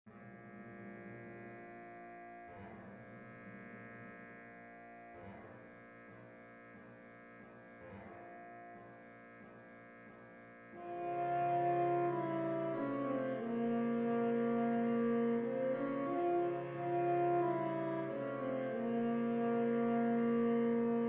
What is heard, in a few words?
March Slave Sax; Tchaikovsky